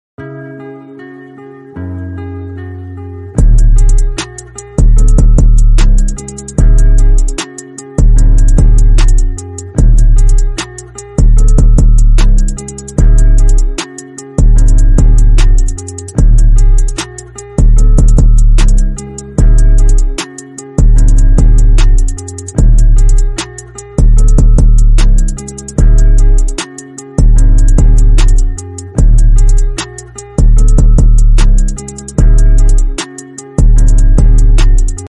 bank account beat

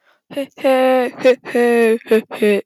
jeje

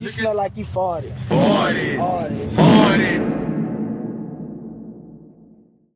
reverb fart

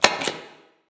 shut camera; fnati

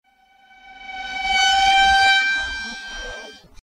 waterphone reverse

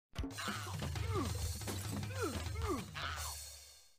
fight pain and injury; space quest 6

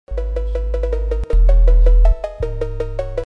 cowbell theme

